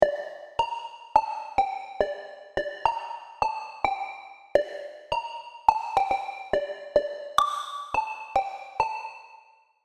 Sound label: serum plucks; chiqui audio